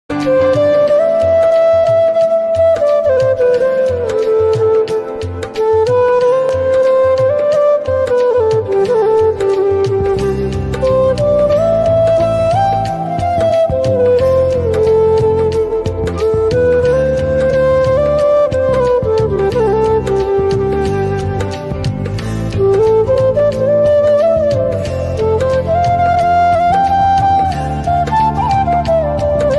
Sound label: instrumental emotional ringtone